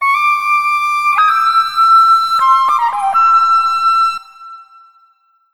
SHAKU LP, Asian Traditions; Soundscan vol.60; AKAI; SHAKU LPS

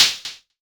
snare, Korg Electribe ER-1

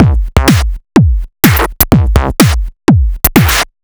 Huge Crush, Drum Loops, 125 BPM; Sonic Foundry (Sony Creative Software); Underground UK House & Electro

